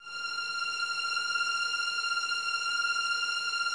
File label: VNS F 6, Violins; Miroslav Vitous; String Ensembles